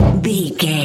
metal drum impact thud

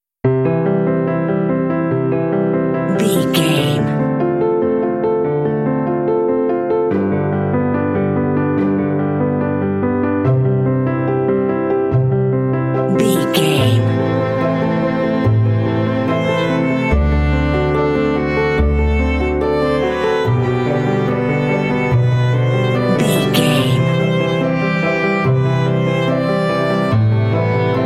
pretty piano and violin song

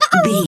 chicken bwak

